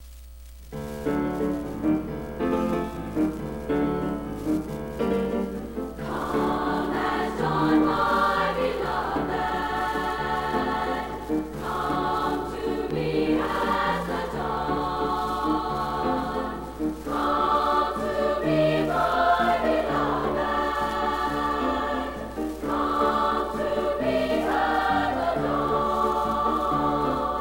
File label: Three Spanish Ballads, chorus